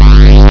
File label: Shields; Eat My Photons!